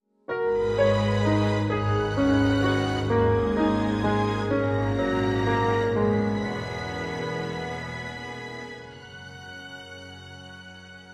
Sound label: Orchestral